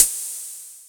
MS 20 HH, dry perc, Quasimidi Rave-o-lution 309

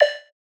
Vocoder Lead One shot D4

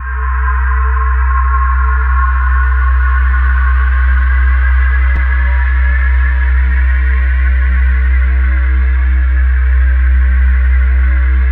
CYCLONE, CYCLODRONE; Spectrasonic Distorted Reality 1

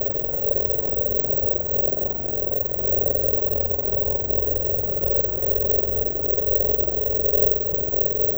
Drone Ambient; Drums & Drones

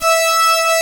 SYNCLAVIER; DIGI2 FM+SMP

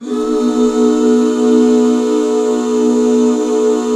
B 7, back chords; Sound & Vision - Gigapack II CD 1

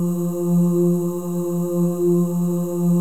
FEM OHS, Symphony of Voices; Spectrasonics

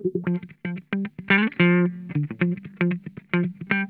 SKIN DEEP, Funk Guitar; 90 s Sample CDs; Zero G

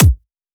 Dubstep Dubstep Kick

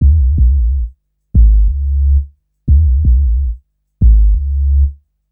Rude Bass, Drum & Bass; Fluid Dynamics

